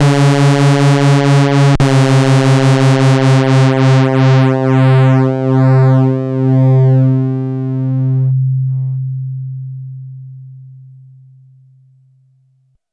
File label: Arr Bass, Drum n Bass; KJ Sawka; Drum n Bass & Beyond